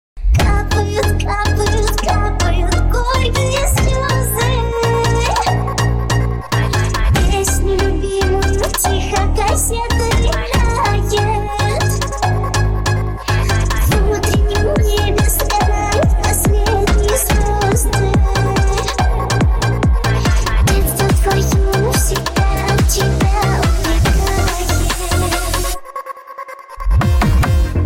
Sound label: phonk techno remix